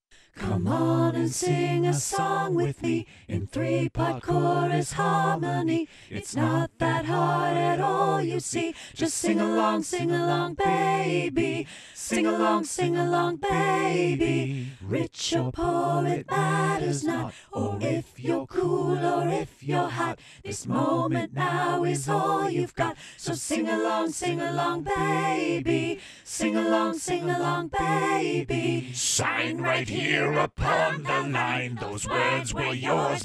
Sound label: Sing Along Baby; ccMixter